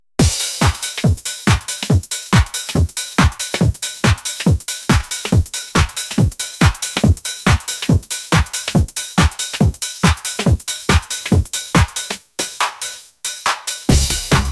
ride the bass bass

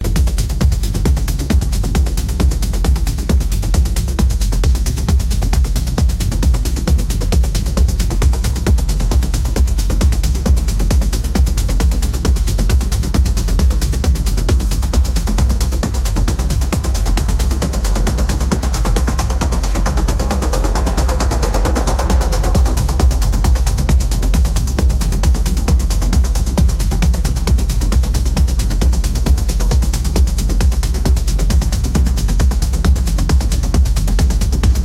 electric heartbeat

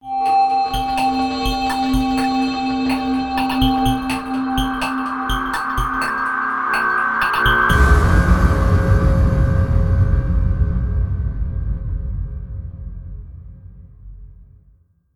intro jingle

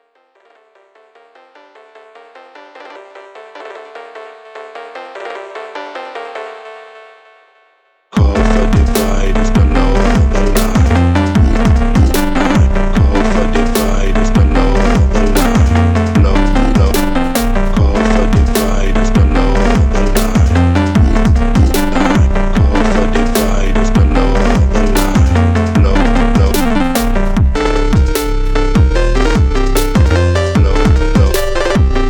divine phonk

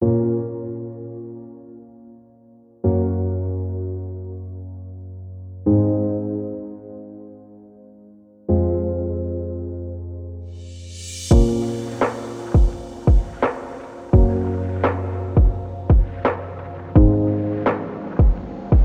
pop song piano amp electronic instruments 85 bpm

